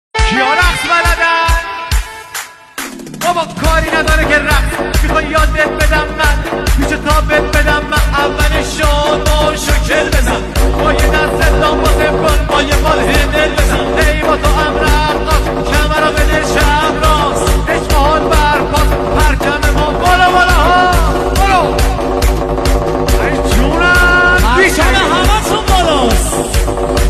music shad remix

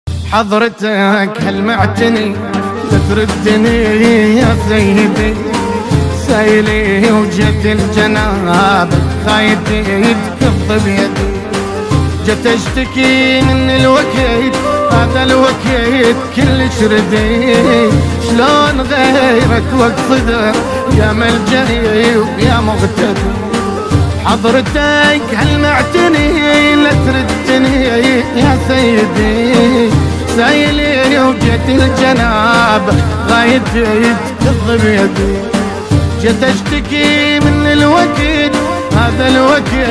shoti arabi

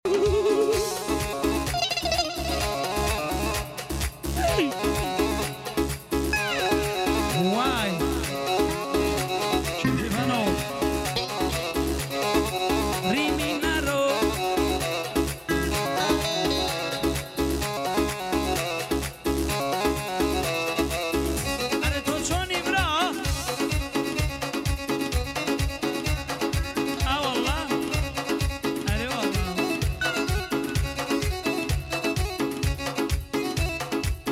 music shoti kordi

